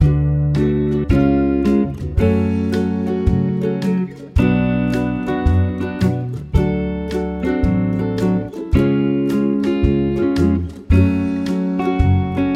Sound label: minor key chord triples, F sharp